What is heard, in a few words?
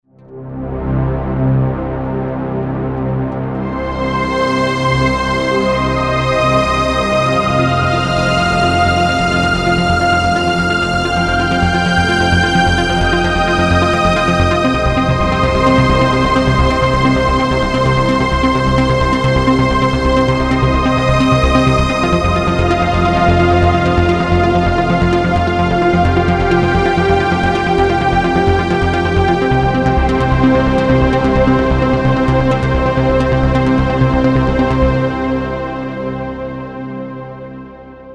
Lush pad